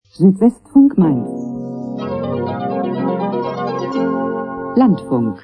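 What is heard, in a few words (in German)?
landfunk, Radiojingles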